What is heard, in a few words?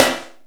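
jet snare drum, FM drums; East West; Global Sounds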